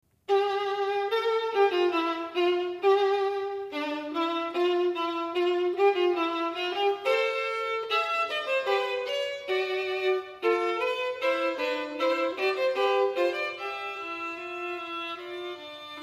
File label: violin